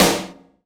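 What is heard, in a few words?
TOM HI, drum machine; JD DRUMS KIT; EdgeSounds; Drum Mashines VOL-1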